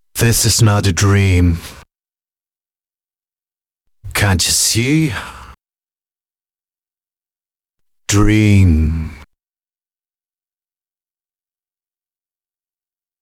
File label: vocal mix